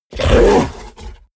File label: fishman groan short; kreaturen